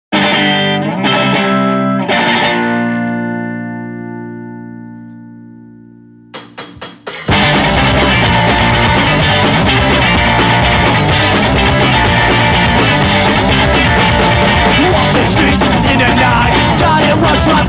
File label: Electric Guitar